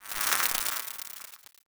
hornet attack flap wing

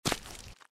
giant rat foot; npc

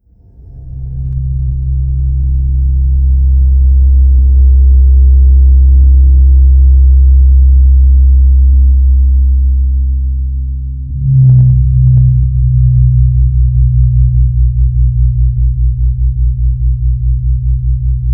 psi begin

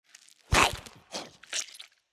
ghoul reaver throw; npc

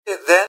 generic robot death; mrhandy; npc

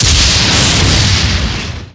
lightning spear cast

